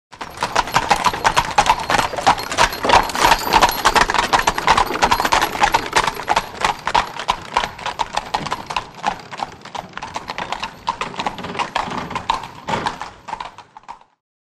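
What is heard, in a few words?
By Horses Landau Up Past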